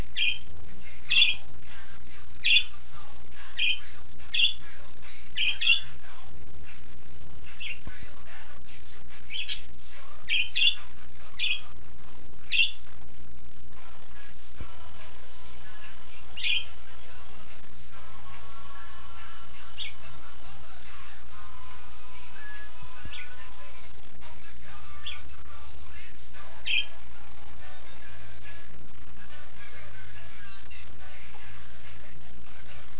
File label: Singing (Hear the Parakeet Keep the Beat)